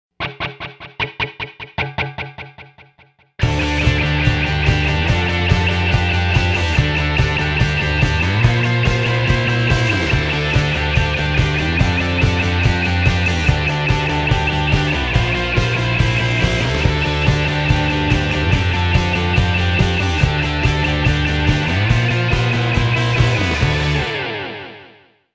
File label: rock alternative